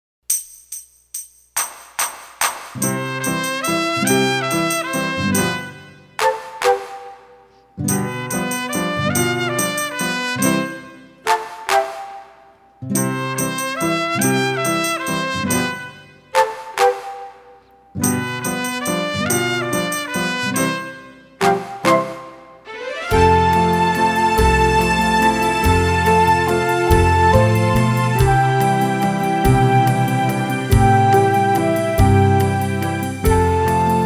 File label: Mexican Clapping Song Slow